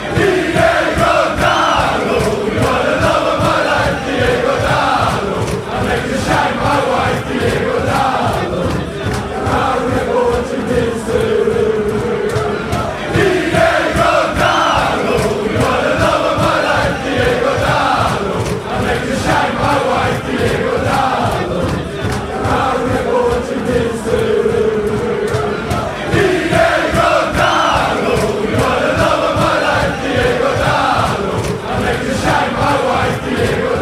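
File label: player chant manchester united, football chants; dalot; united kingdom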